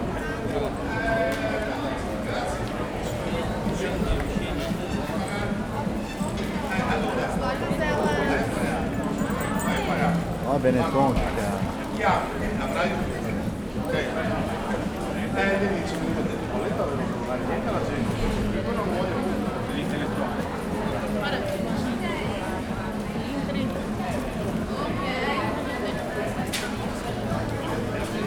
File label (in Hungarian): szent mark ter, hangulat; olaszorszag; velence